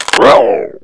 fall; quake2; zumlin

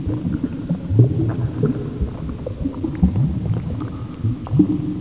blub; quake2; cataclaw

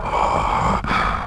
fall; quake2; guard

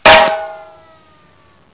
pain; quake2; tankemperor

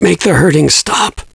pain; quake2; caleb

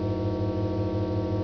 forcefield; quake2

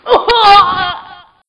death; quake2; austavin

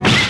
jump; quake2; eva-01

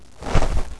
jump; quake2; necromicus

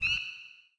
frog; quake2; jitspoe

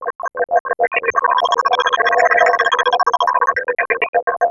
comp room; quake2; zepsounds

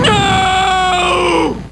death; quake2; homer1 4

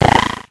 jump; quake2; gunner

